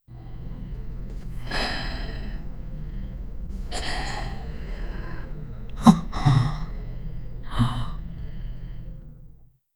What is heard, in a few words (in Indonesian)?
napas mereka terengah engah; tension